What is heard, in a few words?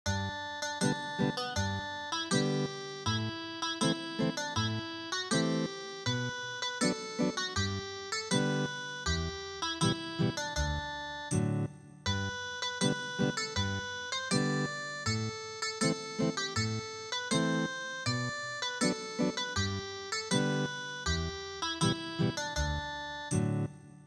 Ethnic Guitar